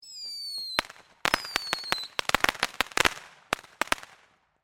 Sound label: Firework Whistles, Cracks, Pops; BlastWave